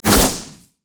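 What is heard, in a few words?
cougar basic attack; nidalee; nidalee base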